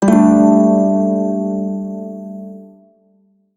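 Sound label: Prime Shawzin Pentatonic Major Chord, Music; Warframe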